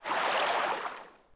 tread; DAoC

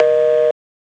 busy

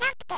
knock

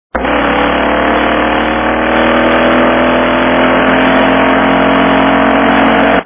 running Sound

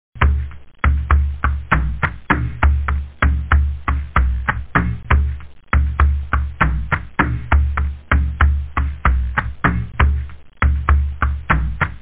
jungle, loop; da capo vol1; instruments

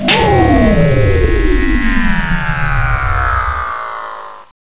Sound open cap

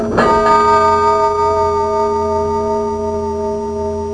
long bell; Household

